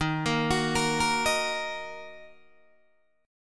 D sharp Minor D sharp down Guitar DADGAD

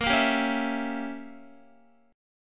A sharp Diminished 7th, Guitar; A#dim7; strummed; x,13,10,12,13,12

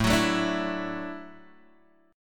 D-Major Add 9th A down Guitar Standard; strummed; JGuitar

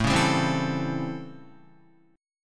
A 7th Sharp 9th A, Guitar; A7#9; strummed; JGuitar

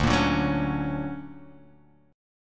D Augmented Major 7th down, guitar

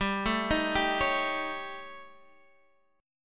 A 7th Flat 5th G

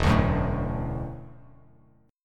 E 9th Suspended 4th E down Bass Standard; E9sus4 strummed; JGuitar

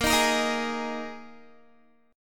Bb 7th Suspended 4th Bb Guitar 4E,4A,5D,5G,5C,6F; strummed

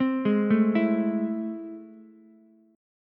A Minor Major 7th, down, Ukulele; arpeggiated; AmM7; JGuitar

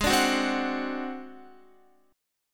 Ab 7th Flat 5th Guitar, chord; Ab7b5 strummed; JGuitar

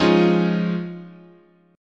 F Minor 7th Sharp 5th, chord

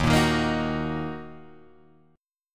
D sharp Minor D sharp, Guitar Drop D; 1,1,1,3,4,2; chordshape

